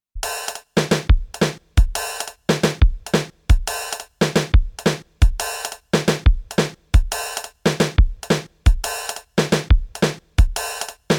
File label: Drumtraks Groove